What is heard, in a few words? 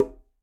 Quinto B Tip, Conga, Latin Percussion; Drums; Drum Hits; Ableton; Factory Packs